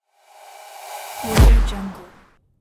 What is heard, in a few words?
pipey sweep impact